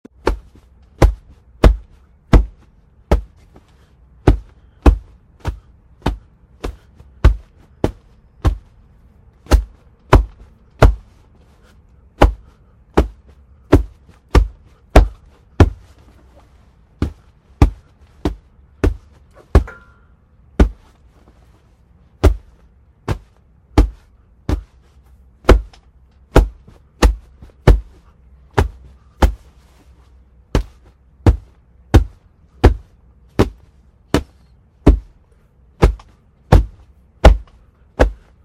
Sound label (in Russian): zvuki udara po bokserskoj grushe